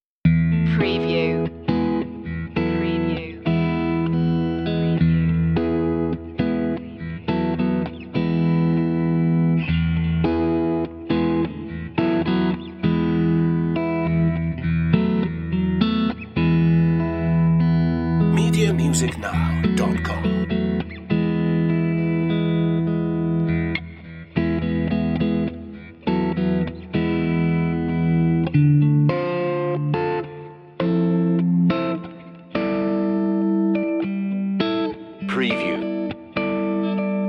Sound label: guitar game underscore